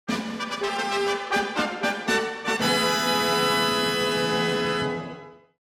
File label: fanfare